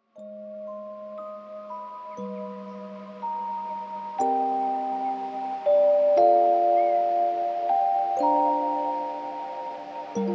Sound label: Music Box Lullabies